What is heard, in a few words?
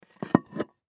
Dinner Plate Large, Dinner Plate Large Pick Up Table; Dishware; Household; Blastwave